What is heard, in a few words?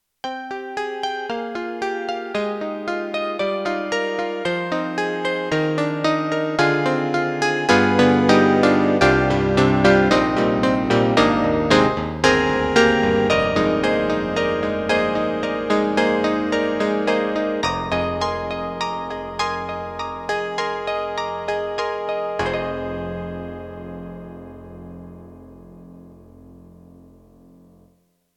Yamaha MU1000EX Synth CP